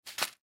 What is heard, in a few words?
Box Plastic Push, Box Push Pins Set Down Drop; Rustling; Objects; Blastwave; Foley